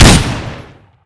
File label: gun